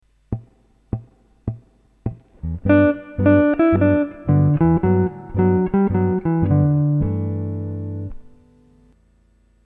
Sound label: Major 7th Lick, guitar